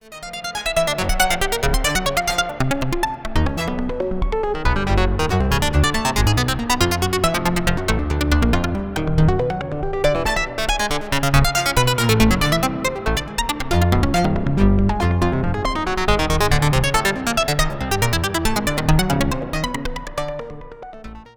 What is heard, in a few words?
random chromatic to both voices, string synth